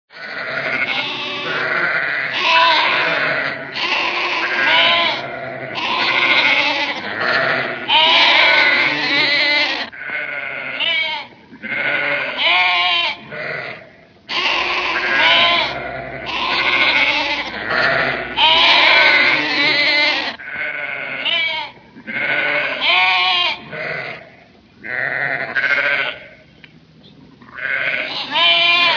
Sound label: Flock of sheep